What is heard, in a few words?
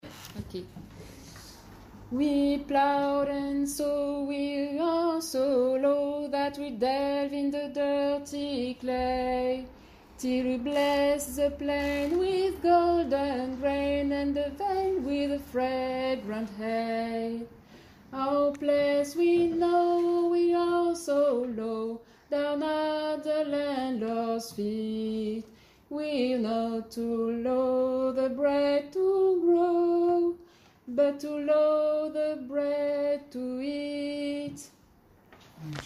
Voix; Song of the lower class; Version originale Ernest Charles Jones (1852)